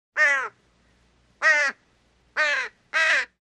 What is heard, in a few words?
Bird, Crow; Caws, Close Perspective.